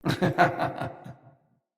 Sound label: Laugh, Battle, Barks, Dialog; Mendicant Wazard